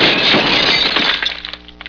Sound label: GLASS